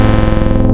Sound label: Chord, wavetables; Animoog